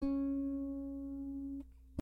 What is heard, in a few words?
string3 c sharp3